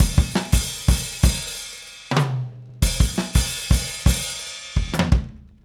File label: Drums, 70s Analog Funk